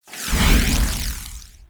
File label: Thorn Bow Equip; Weapons; Player; Bows; Fey Thorn Bow